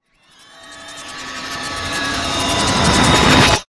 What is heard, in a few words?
Ability Mimic Tell; Lurker; Evolve; Gorgon